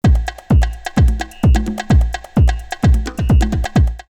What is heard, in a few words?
drumloops Rave 129; X-Static Goldmine; 90 s Sample CDs